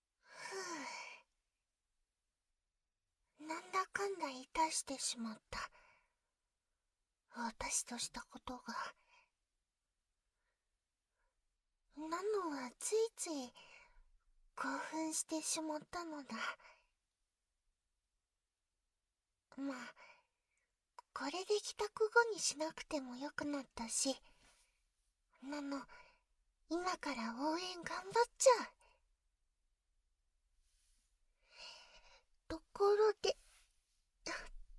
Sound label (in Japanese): 優しい耳舐作業